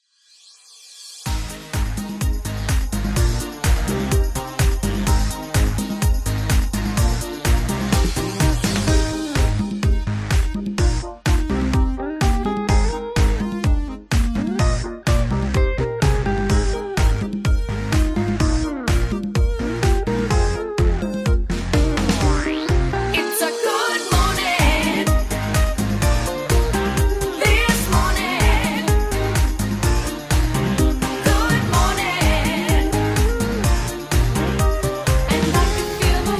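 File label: Instrumental with background vocals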